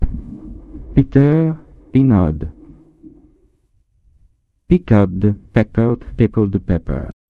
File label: jingle